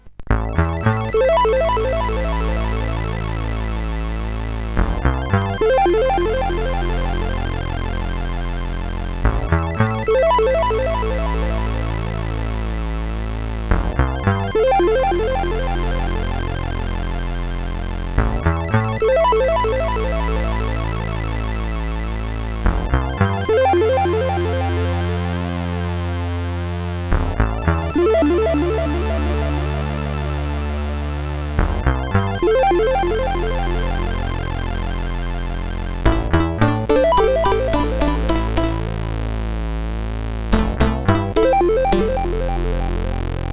Space Orbs, sid, CSG8580R5

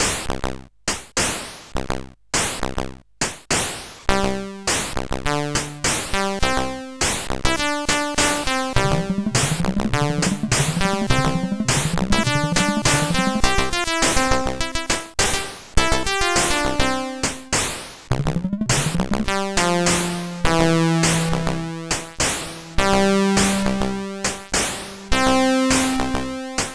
Super Synth Sample, sid CSG8580R5

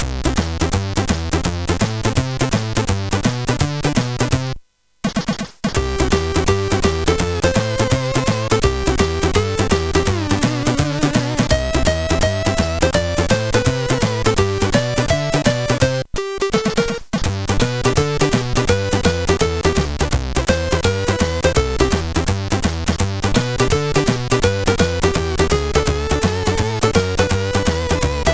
Guitar, sid; CSG8580R5

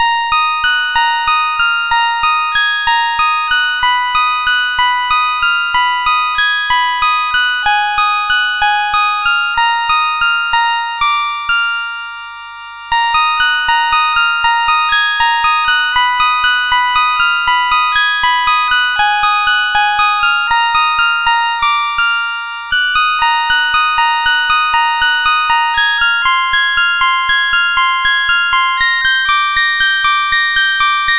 Samba, sid CSG8580R5

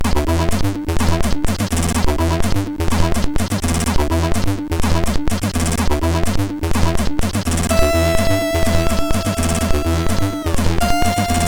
Cracktro, MOS6581R3; hvsc

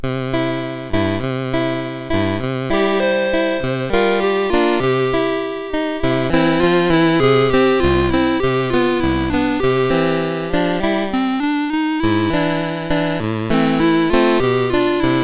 Piano Concerto No2, MOS6581R2

